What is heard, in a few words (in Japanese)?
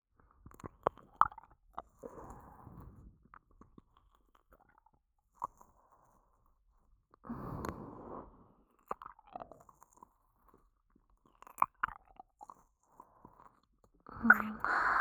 左右耳舐め, ASMR; アドリブ集